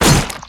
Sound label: Melee Physical Heavy; Kilta